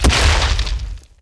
Woosh

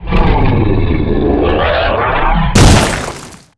Fire Destroyer

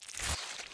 foot water

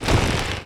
Stone Tree

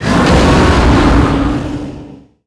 Die

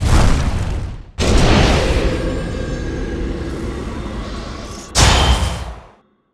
silence Cast

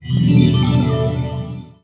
cancel scroll

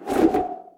Flap

